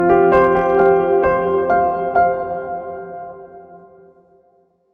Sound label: victory